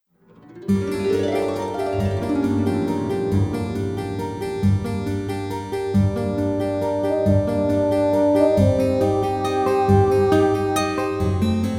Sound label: French Travel Music; pirates of the burning sea game soundtrack 2007